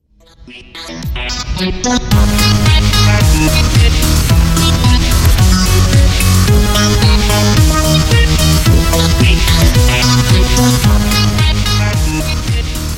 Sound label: rainbow theme